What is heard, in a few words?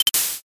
lighter